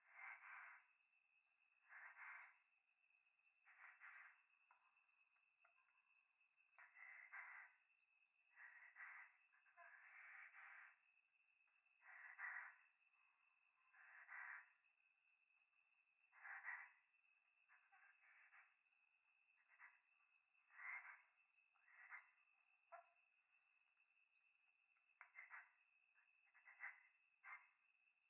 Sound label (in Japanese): 風呂 左耳密着吐息 山奥夜